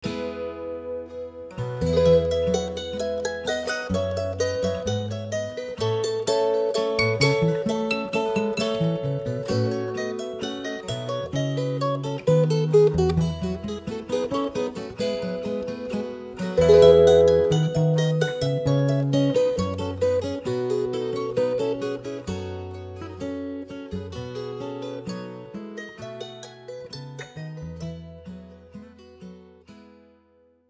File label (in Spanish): valiha